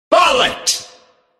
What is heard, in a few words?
Bullet